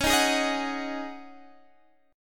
C sharp Suspended 2nd Suspended 4th C sharp, chord; C#sus2sus4; strummed; x,16,13,13,16,14